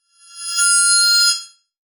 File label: Transition Bell Swell; Adobe; Production Element